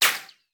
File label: Bullet Ricochet; Sound Ideas; Guns